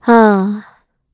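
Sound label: Vox Sigh, Korean; Hilda; kingsraid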